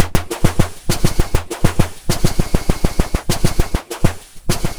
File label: glitch loop for artcore